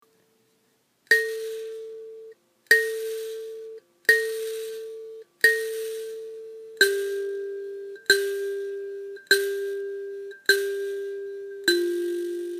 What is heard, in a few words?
Karimba Nyunganyunga Tuning, mbira